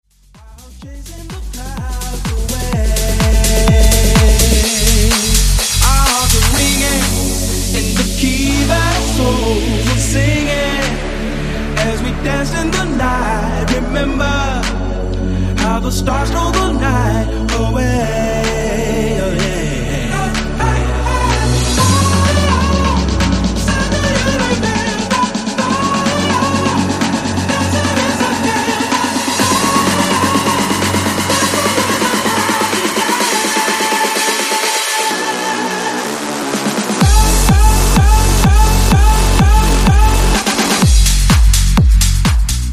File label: 70s tech house mashup